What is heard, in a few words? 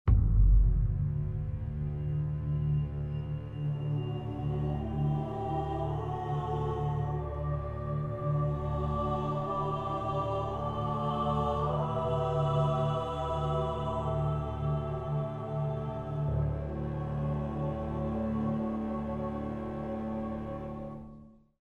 Music; Microsoft Studios; Age of Empires III - Complete Collection; cinematics